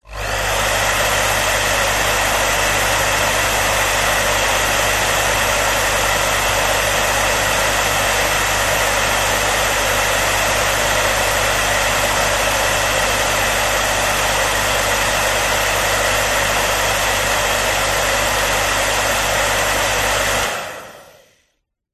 household hairdryer on run static off rear professional; visage; zapsplat